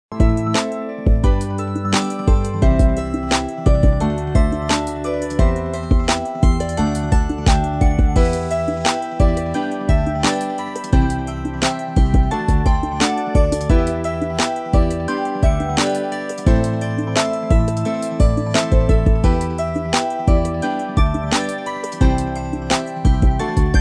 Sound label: Modern Pop Groove Ringtone; Duration Is 31 Seconds